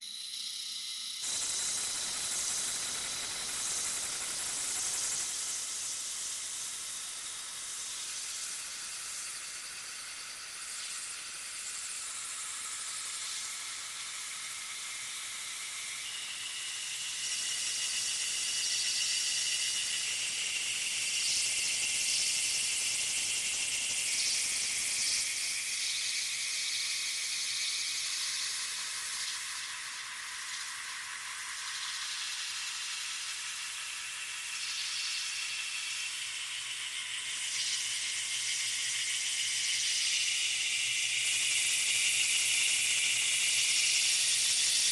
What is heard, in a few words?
insectoid humming